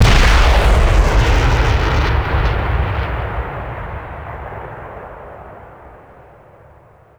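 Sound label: axis bazooka soldier; WAR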